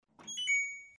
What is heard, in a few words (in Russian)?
pauza st mash